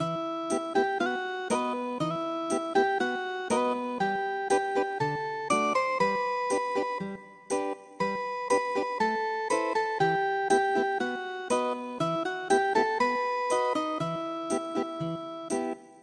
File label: Kosak Ethnic Guitar